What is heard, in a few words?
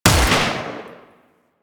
Shot 3D, Rifle; Warframe; Eidolon; EidolonEliteRifleLancer